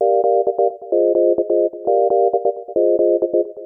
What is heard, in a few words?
Kaossilator gated (130BPM); musicradar